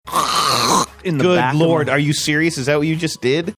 making throat clearing sound good lord are you serious